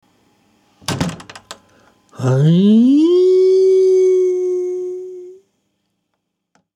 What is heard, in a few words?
Sub lift